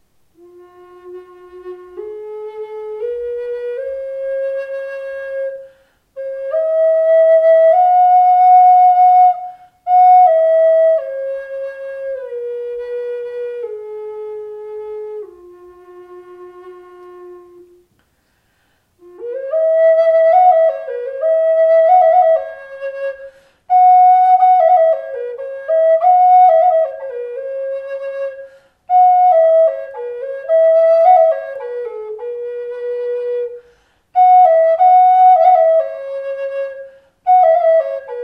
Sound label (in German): Bambus Fis 432 HZ